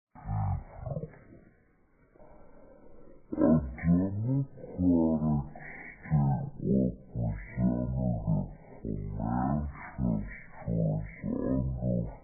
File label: nuke; vapesquared